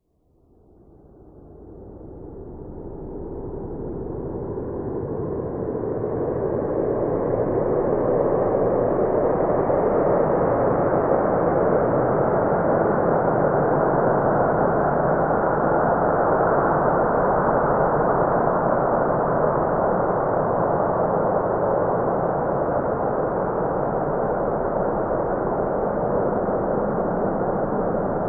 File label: science fiction atmosphere planet landscape windy desolate